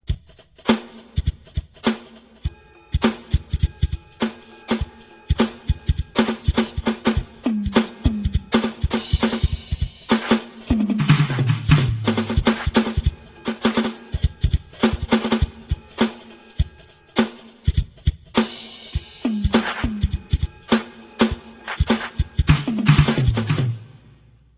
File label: rhythm